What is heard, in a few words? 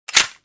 MK23 Clip In; csof